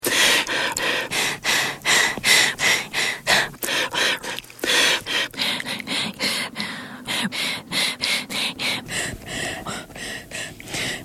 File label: Breathing; NPR